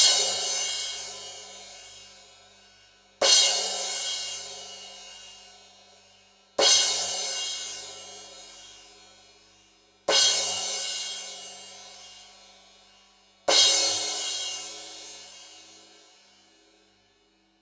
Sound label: cymbal